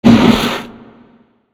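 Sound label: boss damage up; galiardo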